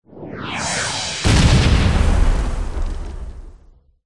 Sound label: jet; c-evo